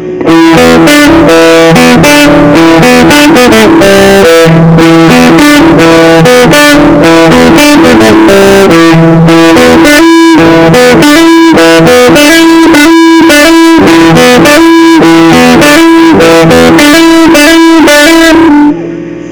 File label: Rams Treble, Big Muff Fuzz; JHS Muffuletta; Stoner Rock; guitar riffs